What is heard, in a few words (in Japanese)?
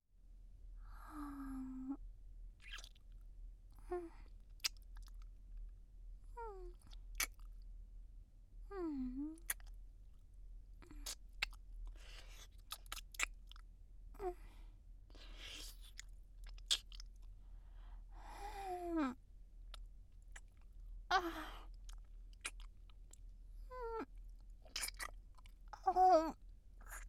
キス; SEあり